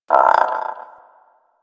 Bird Crow Caw; Ambience; One Offs; Warframe; Eidolon Plains

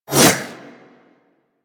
Kunai Whoosh; Stalker Kunai

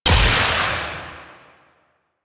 Shot Medium; Weapons; Warframe; Duviri; Incarnon Adapters

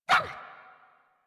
Puppy Idle Bark; Enemies; Vocals; Kubrow; Warframe

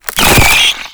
Purification Weakpoint Appear C; Infested Survival

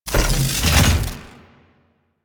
Electrical Hazard Out Of Service Open; Warframe; DE; Vehicles; RailJack; RailJackHazards

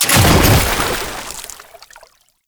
Body Fall Water; Enemies; Warframe; Tau Dax

